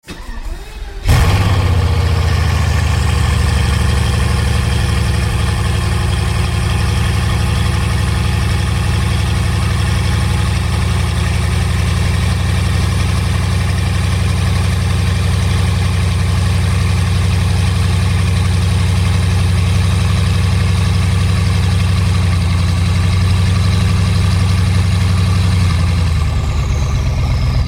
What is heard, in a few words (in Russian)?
zvuk vyhlopa dzhipa